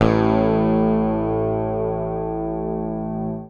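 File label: bass, A1, right channel; PICK; Sampleheads; Will Lee Bass Library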